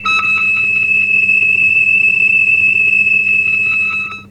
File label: Aflutter; Zero-G; Total Drum Bass; Hits and Bits